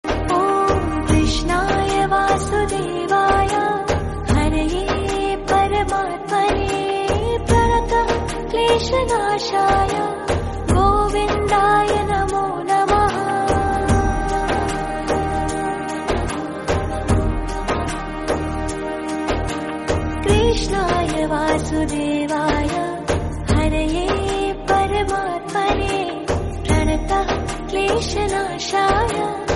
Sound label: krishnaya vasudevaya haraye paramatmane female version